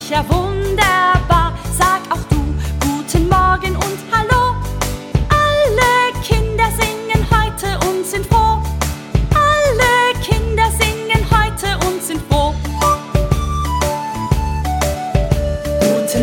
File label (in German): Lied